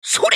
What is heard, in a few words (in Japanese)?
攻撃短; ジャック